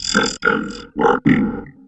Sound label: vox, electro vox; Sound & Vision; Gigapack II CD 2 (Roland)